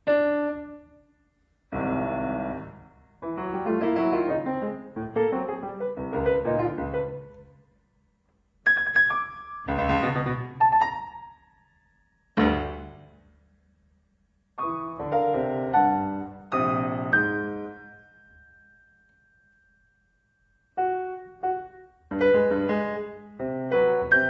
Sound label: piano